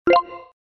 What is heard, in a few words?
sound click